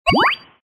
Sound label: map open